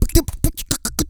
FANCY LIPS, Vocal; Blues & Soul

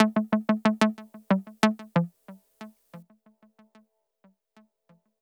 SYNT, Hip-Hop & R&B; USB Soundscan vol.47; AKAI

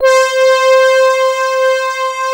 BELL RASS C4, Analog; USB Soundscan vol.49; Analog Fantasies; AKAI; PROPH

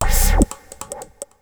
T-WAVE, Synth Waves

